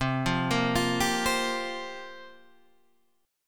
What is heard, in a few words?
F Suspended 2nd Suspended 4th C